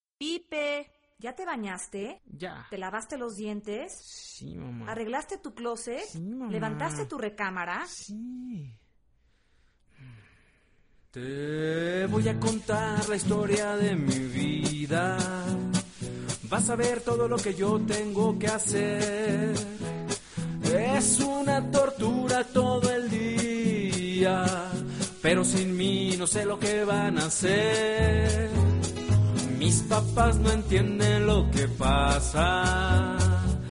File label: pipe